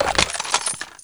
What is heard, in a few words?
Machine Gun Reload